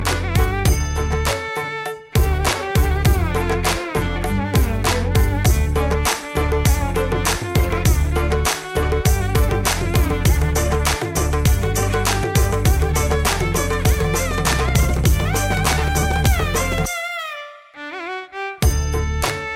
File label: Jingles; Asian Paints